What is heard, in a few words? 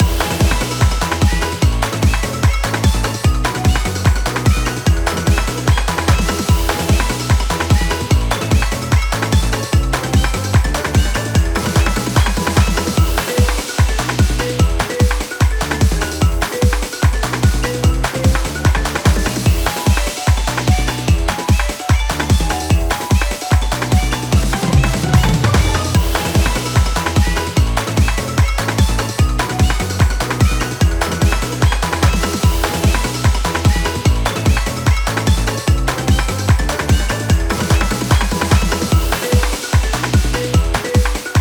BGM RESULT